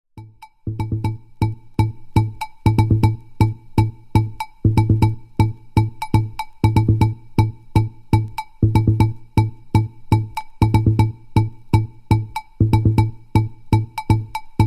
drum ensemble rmx

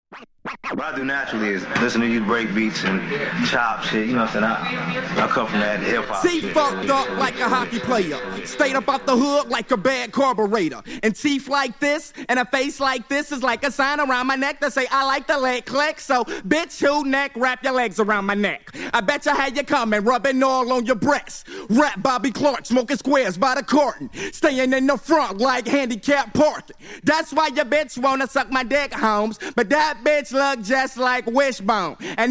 acapella

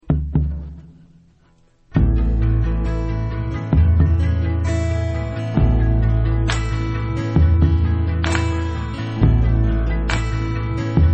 acoustic

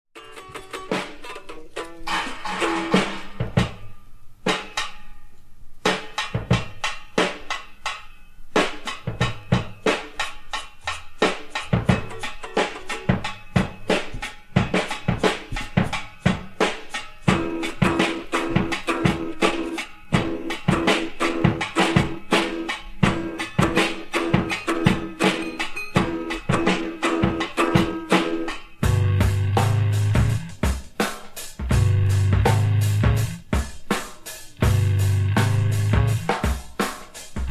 scratch

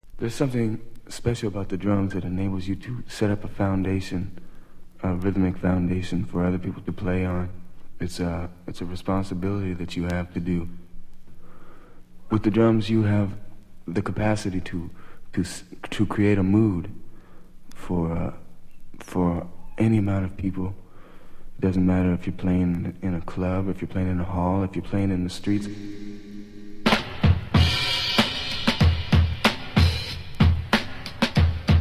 power of the drums

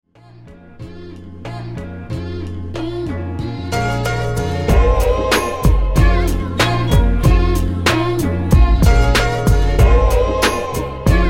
instrumental

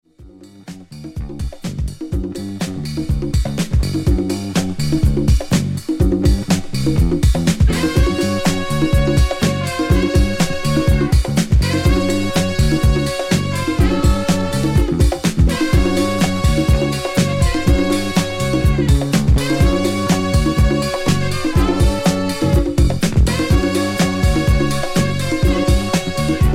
New York noise

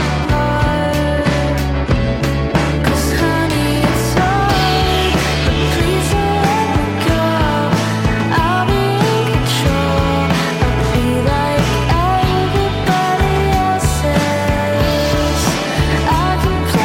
death metal

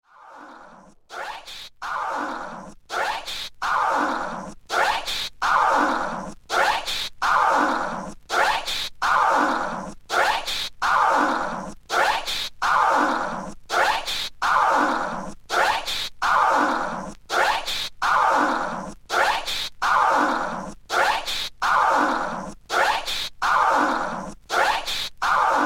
scratch beat; beat junkie turntable tools

